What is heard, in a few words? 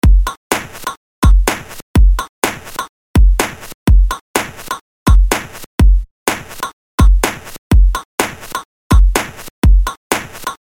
Disco Sirens